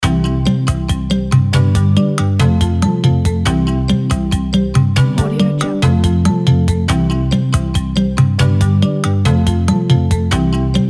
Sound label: tropical loop